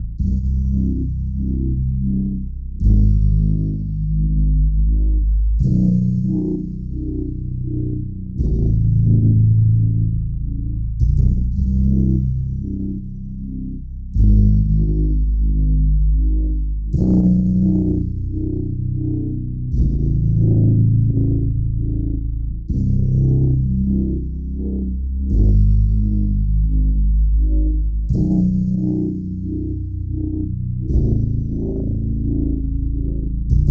boss loop 172bpm; chicory a colorful tale; ps4

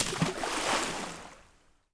Splash Small Mono

